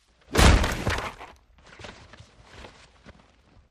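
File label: Football Heavy Impact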